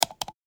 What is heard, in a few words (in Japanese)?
マウスダブルクリック